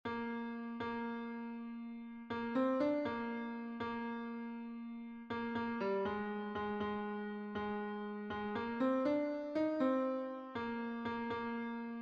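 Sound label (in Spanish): TENOR, himnos en piano; CRISTO NOMBRE GLORIOSO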